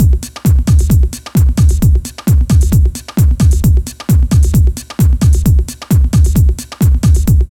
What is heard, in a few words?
LOOP, Tekno Industrial; East West